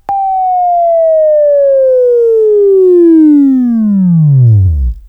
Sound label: Buzz; Sweeping Training